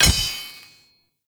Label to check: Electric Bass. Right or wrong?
Wrong